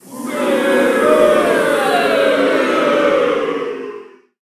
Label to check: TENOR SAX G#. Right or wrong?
Wrong